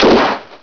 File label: SHOT